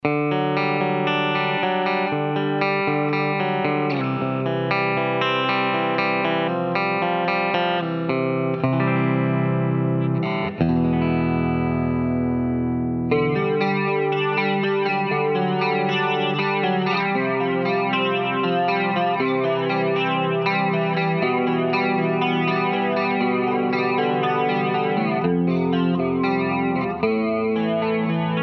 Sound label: Orbital Modulator Clean